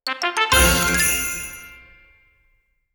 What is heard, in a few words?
medium win; netent